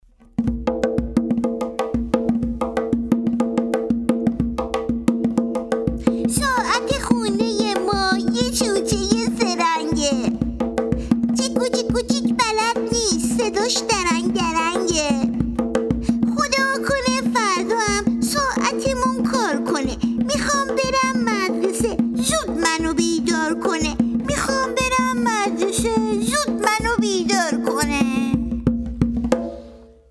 ساعت خونه ما

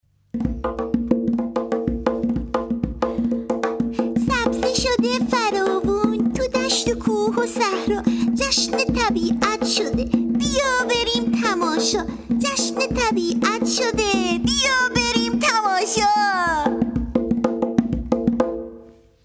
سیزده فروردین

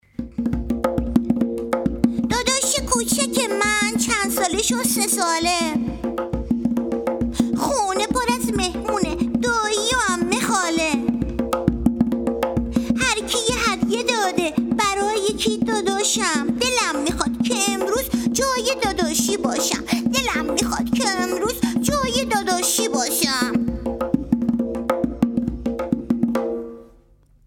تولد داداشی

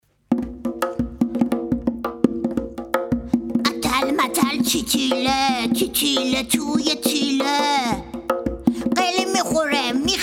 تی تیله

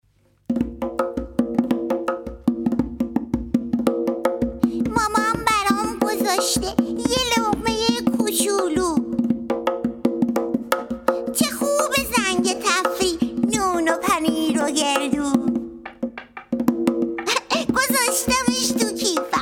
لقمه

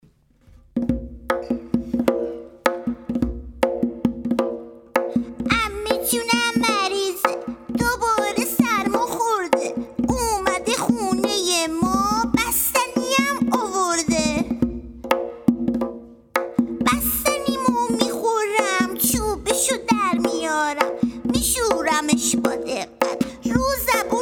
دکتر بازی

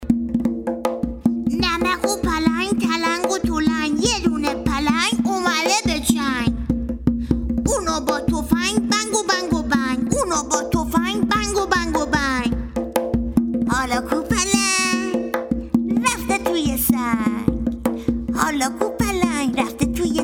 نمک و پلنگ

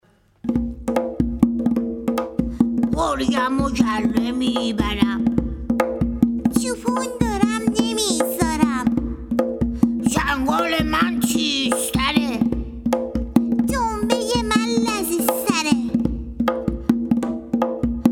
گرگم و گله

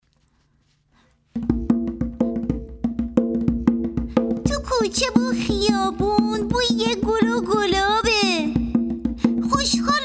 بیست و دو بهمن

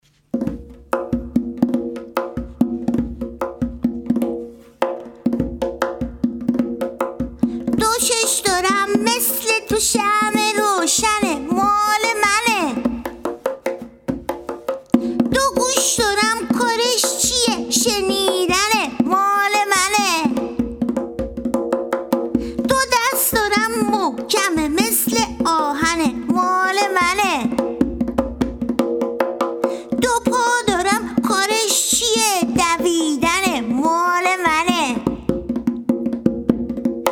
اعضای بدن

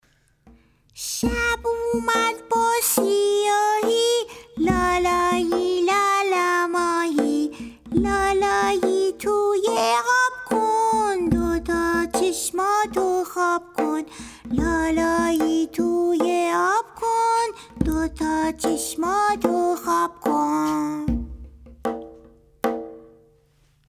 لالا ماهی

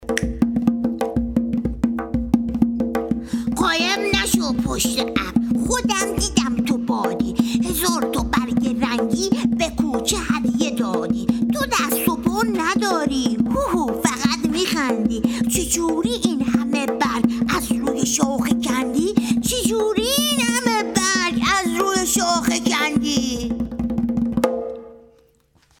هدیه باد

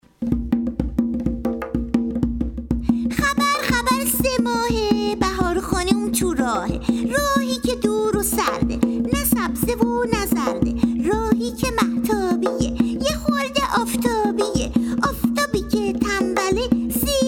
خبر خبر

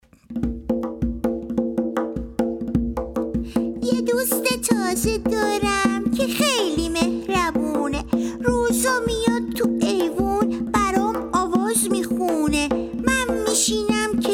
دوست تازه

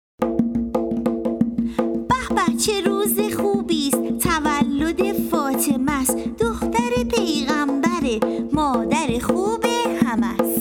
تولد فاطمه

